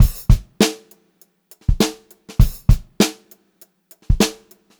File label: Funk Groove Drumloops; USB Soundscan vol.38; AKAI